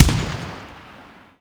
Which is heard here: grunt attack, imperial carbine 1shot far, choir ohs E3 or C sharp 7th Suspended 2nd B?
imperial carbine 1shot far